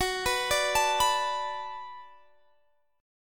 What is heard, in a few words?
B Minor 7th Gb, chord